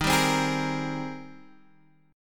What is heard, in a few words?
Ab Major 9th Eb